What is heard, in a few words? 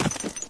player step; Source Engine; dod